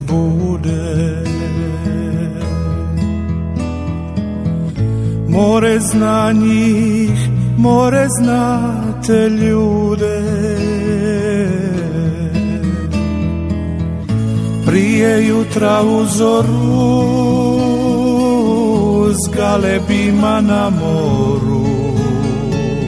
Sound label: romantic croatian